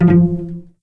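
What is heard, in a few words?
F2 pizzicato cello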